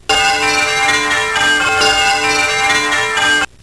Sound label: Liberty Bells; Revolutionary War